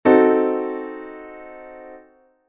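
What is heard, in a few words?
Cdim7 chord; ジャズピアノ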